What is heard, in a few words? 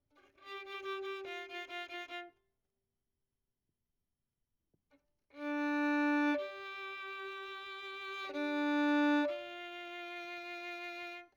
Violin; Verdigris Music